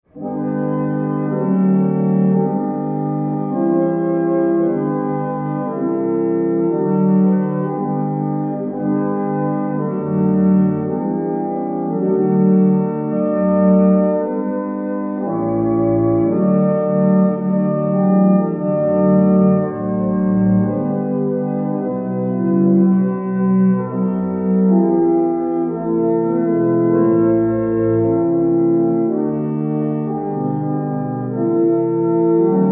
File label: barrel organs; Barnston; St Matthias